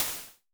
Snare Crash, Vermona Mono15 Drums

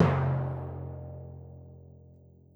Timpani, Orchestral Percussion One Shots; Sonic Foundry (Sony Creative Software); Orchestral VOL-2

